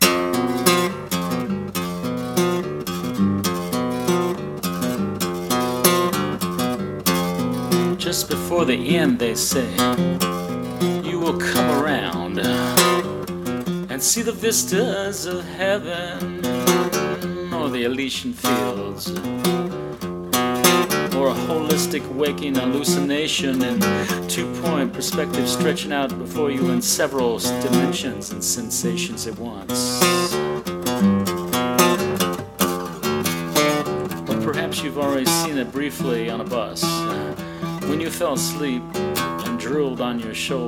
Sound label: Snow Falls Rain Drops